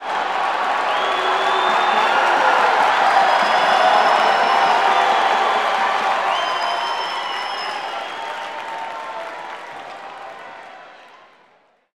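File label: Basketball Crowd Cheer; SFX Kit; Crowds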